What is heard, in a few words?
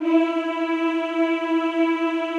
E3, Classical Choir; Best Service; Extended Classical Choir